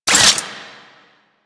Necramech Repair Drone Idle Short; Warframe

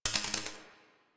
Laser Skin Printer Laser Stop; Ambience; Props; Warframe; Entrati

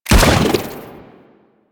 Bubblegun Pistol Shot; Warframe; Weapons; Tenno

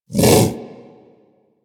Predasite Vocal Bark; Warframe; Hunting Critters

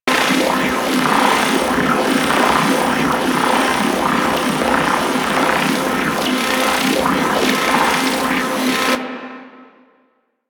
Alt Shot Loop, Weapons; Warframe; Tenno; Miter

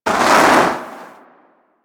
Eruption Fire Extinguish; Sargas Ruk

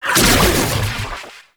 Archon Whip Whoosh; Warframe; The New War; Nira

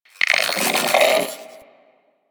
Sentient Brachiolyst Idle; Enemies; Barks

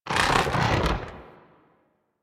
Head Pull; Warframe; Teralyst; Ropalolyst; Flight

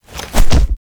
Glyph Game Push Foley; Drifter; Warframe; Duviri; Glyph Puzzle

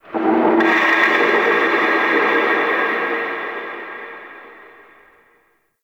Under City Antique Rattle Distant

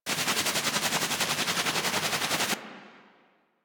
Flashbang Loop; Warframe; Grineer Death Squad; Weapons

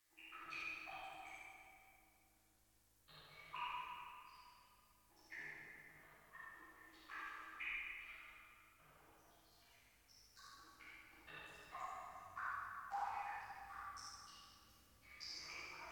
Dungeon Island Cave Drips, Ambience; Warframe; Duviri

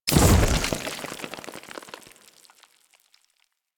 Web Explosion; Enemies; Abilities; Warframe; Corpus; Arachnoid Hunger

